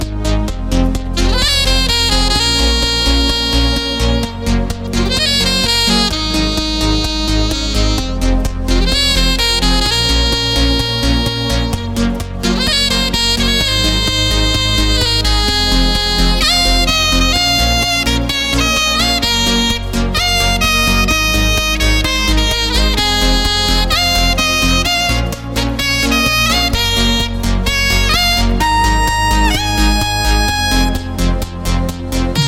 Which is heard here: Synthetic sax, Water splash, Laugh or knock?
Synthetic sax